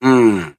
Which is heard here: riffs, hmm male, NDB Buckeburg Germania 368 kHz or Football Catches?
hmm male